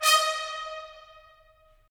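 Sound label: brass trumpets staccato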